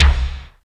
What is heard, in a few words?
CYBER KIT, DRUMS